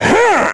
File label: dorian hammer; Crypt of the NecroDancer